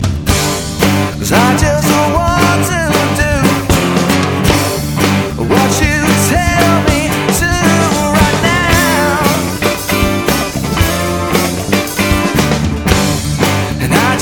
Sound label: chorus ringtone